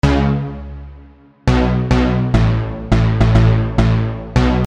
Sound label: pluck, Pluck Synth